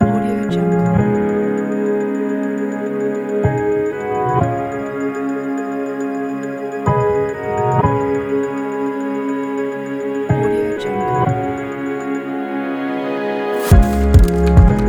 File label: documentary technology soundscape